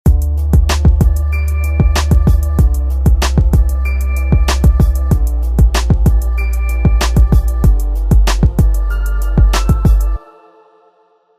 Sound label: minus rap